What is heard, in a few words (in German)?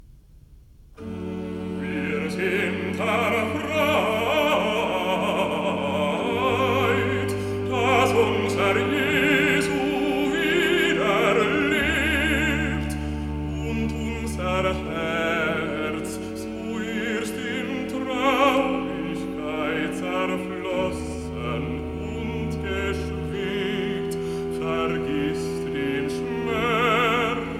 Rezitativ Wir sind erfreut (Baß); Bach Oster-Oratorium, BWV 249; Ludwigsburg 1968